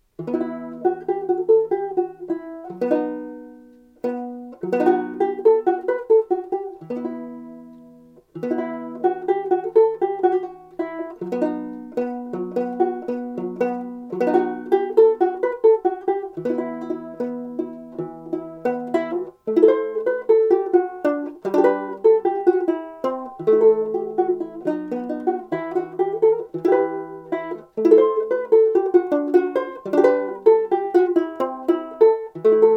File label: Melodija Bandurka Banjolele; Eddy Finn EF-BU1